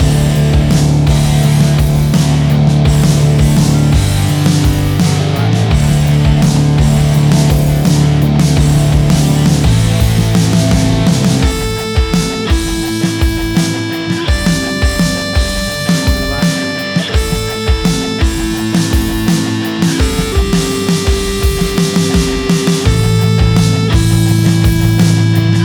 Stadium Rock

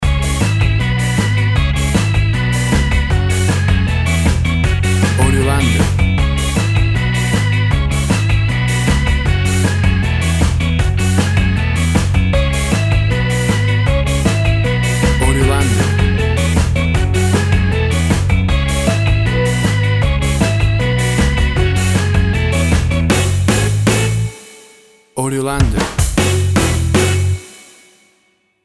UK Ska Music

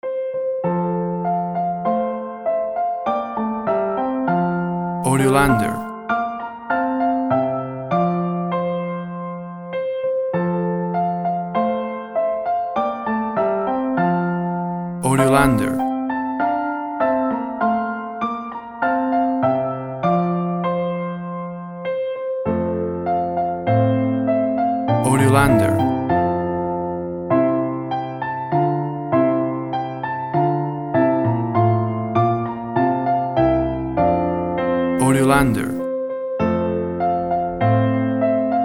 The Twelve Days of Christmas Piano Version

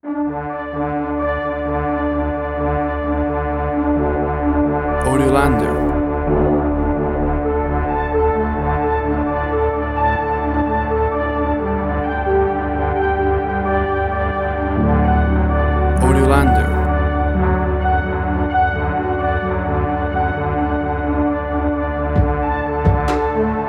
Drumcore

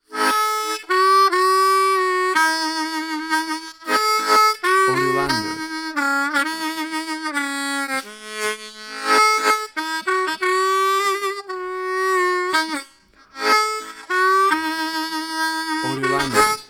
Blues Bar Harmonica Solo; AUDIOLANDER

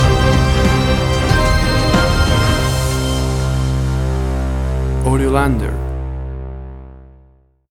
Booming Bass Synth Action Stinger

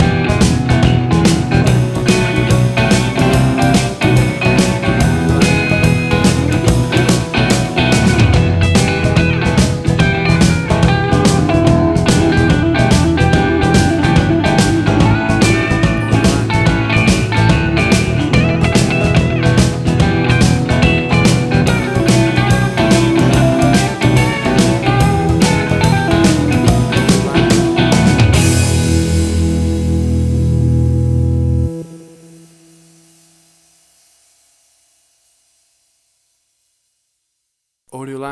Blues Shuffle Country Star; 30 SEC; AUDIOLANDER

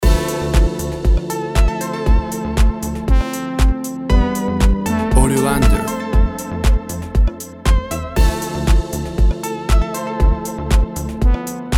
Tropical House Groove

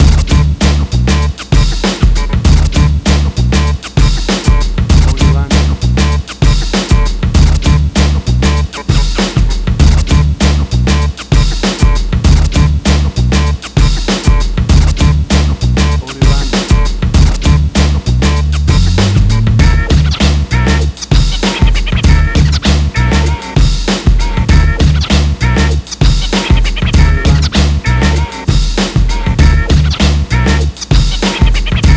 90s Hip Hop

